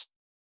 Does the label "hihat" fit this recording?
Yes